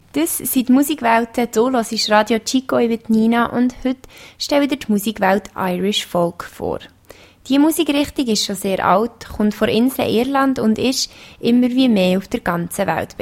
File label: Irish Folk